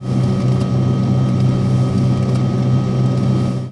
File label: run